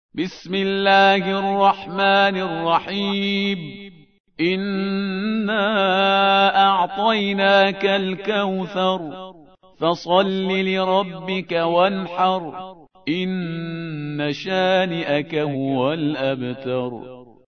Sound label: Tarteel Al-Kauther; Parhizgar